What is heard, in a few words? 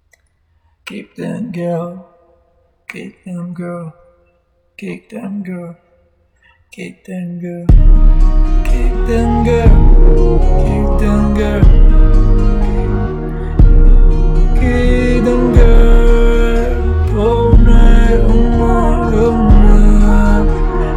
Rap